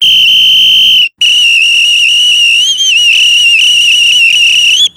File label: police whistle